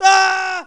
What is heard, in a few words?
Voices Male Fall Scream; gamerip; lucius ii the prophecy